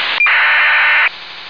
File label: ACARS; multimode